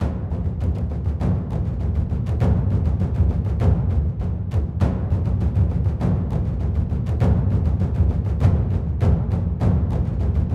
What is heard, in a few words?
Taiko Drums; Grand Ages Medieval